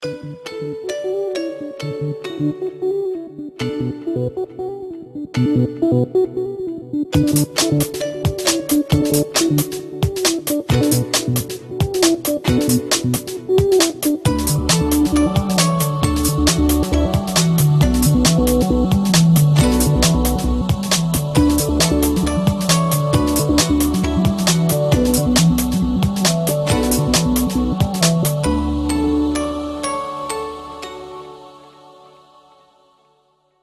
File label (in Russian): originalnye ringtony i uvedomleniia nokia 808